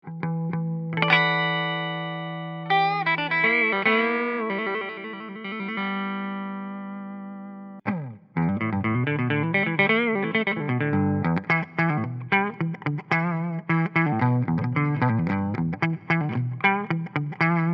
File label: Hyper Vintage Neck Clean